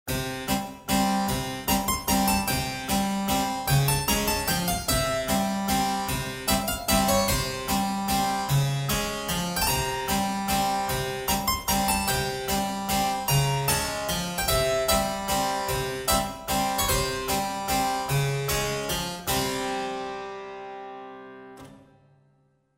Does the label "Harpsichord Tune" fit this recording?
Yes